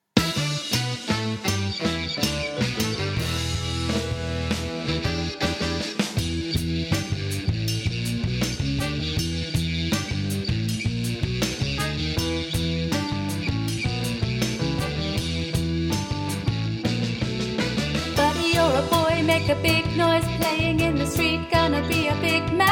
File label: VOCALS